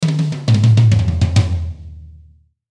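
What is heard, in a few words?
Musical Element, Drum Snare March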